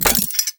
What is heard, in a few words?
Scythe Mech; Weapons; Melee; Warframe; Archwing; Exalted Archwing Scythe